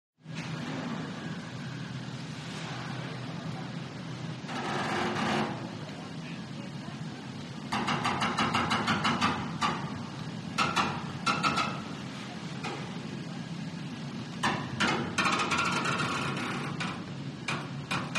BOATS & SHIPS STATEN ISLAND FERRY: EXT: Docking, hull screeching against pilings, rubber bumpers.